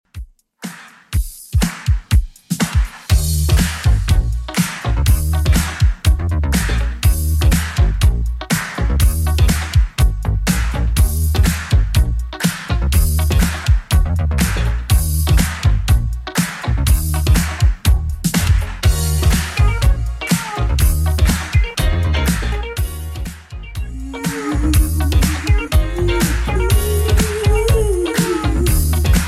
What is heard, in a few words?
80s Redrum 122bpm; remixplanet